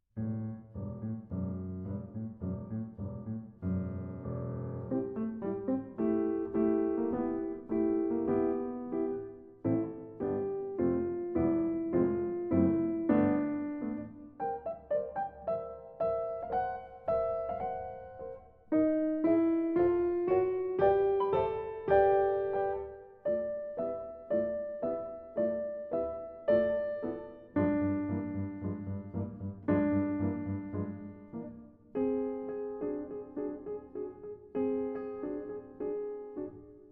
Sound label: Version for Piano; Les pantins dansent